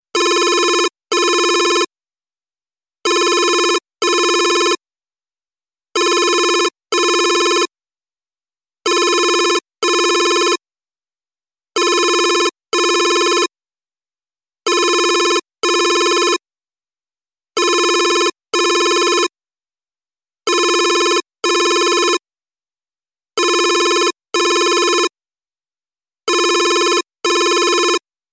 Different old phone

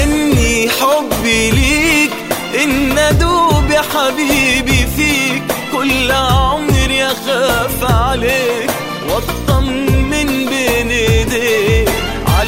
shaker

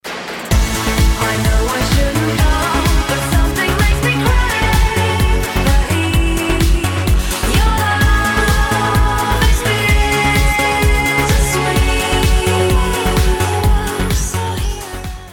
sweet mom song

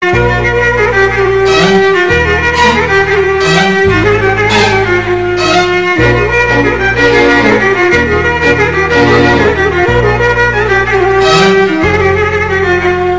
Libyan music

